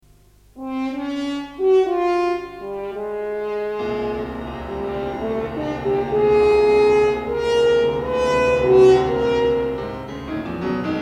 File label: horn sonate